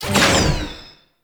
Mirror Defense Rune Shot Cancel; Ambience; Gameplay; Warframe; Entrati

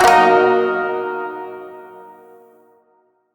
Shawzin Yo Chord C; Chords; Warframe

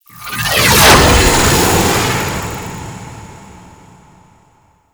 Radial Disarm Ability; Warframe; Loki

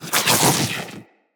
Root Spider Mother Web Shot Proj Whizz By; Animals; Spiders; Abilities

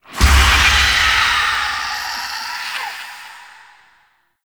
Survival Start; Encounters

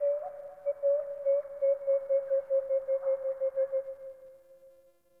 Eidolon Birds Low, Birds; Warframe; Eidolon Plains; Eidolon Landscape

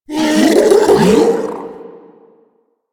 Infested Ancient Strike Scream; Warframe

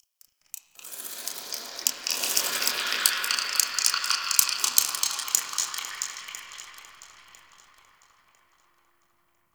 Exterior Space Rings Details; Warframe; RailJack; Ambience

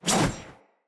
Sword Whoosh Heavy; Weapons; Player; Swords; Garren Sword

